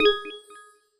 chip minor

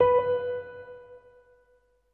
wood spinner

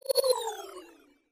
conveyor item leave

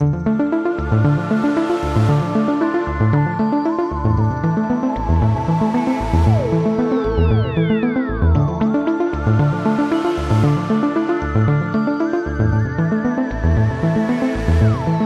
space background music

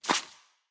winter tile drop

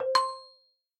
game menu

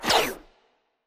space tile flip back

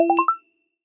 conveyor item centred

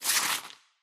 winter tile pickup

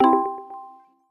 chip minor

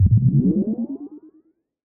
conveyor item appear

